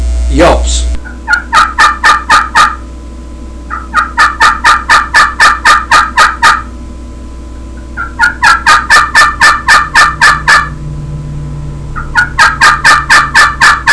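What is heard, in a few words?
triple throat slate yelps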